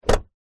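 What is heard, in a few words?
vehicles car door close gentle exterior; zapsplat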